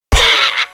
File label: Bird Pig Death; Angry Birds Pool; flash online windows gamerip